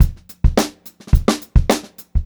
BEAT, Funk Groove Drumloops; USB Soundscan vol.38; AKAI

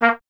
BRS TP A#0LR, Trumpet; Miroslav Vitous

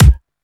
Bd Electric, Kick Drums; Zero-G; Soundclash

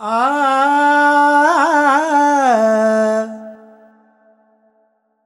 Voice, Vocals Male, World Pop; Sonic Foundry (Sony Creative Software)

